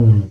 BASS, AC BASS, AKAI S6000; AKAI S6000 CD-ROM - Volume 1

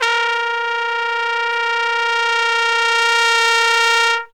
TRUMPET FX, Phantom Horns; Zero-G

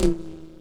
mod tom, Mod Drums; Synths and FX; neuro; Sinistarr

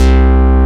synth bass C2

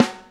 Snare, Drum & Bass

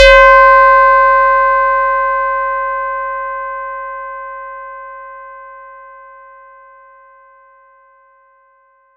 FX RINGR; ModelCycles

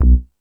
Hip Hop, Drum Machines E-MU Pro-Cussion

